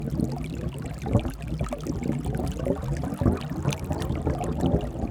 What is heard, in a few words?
ui player spell water; Shelter